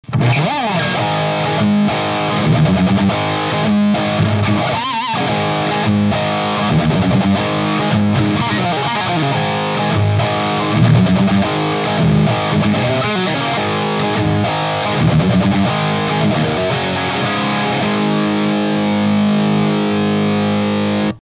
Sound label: Overdrive DS7, Ibanez Guitar Effects Pedals